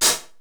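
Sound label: 909 closed hi-hat; 480L; Wizoo; Platinum 24 Electronic; 480L BW 909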